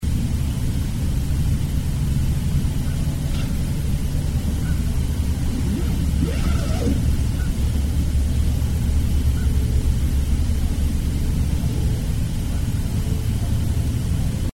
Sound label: zipper; bigfoot